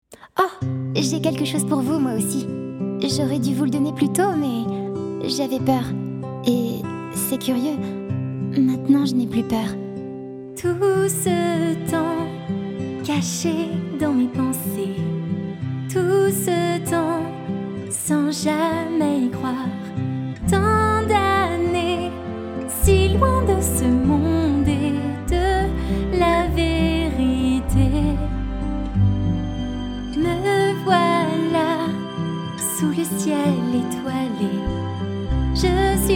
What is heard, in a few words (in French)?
chant; demo chant disney; raiponce